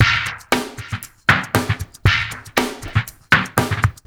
DRUMMY L; Spectrasonics; Liquid Grooves 1 & 2; 117 AQUAFUNK

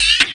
RSS, 160 BPM

